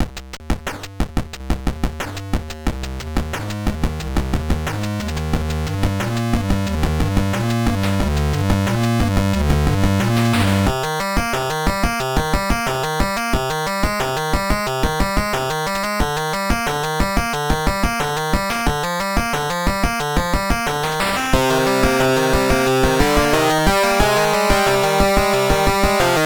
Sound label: powernoise; putting the power in powernoise